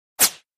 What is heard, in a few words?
Button Whoosh Sci F